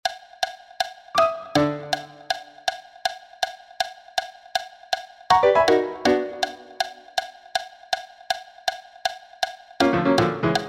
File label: click 80; Hoover